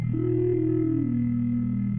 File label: cropped sodaplay drone; OSWorkshop; .microsound repository